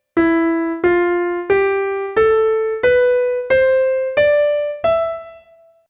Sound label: Phrygian